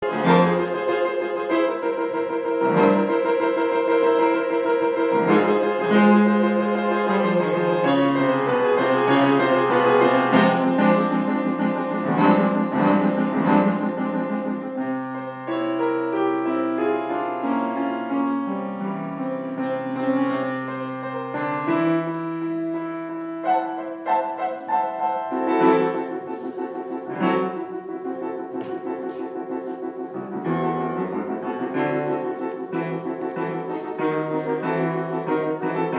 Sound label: slapstick film accompaniment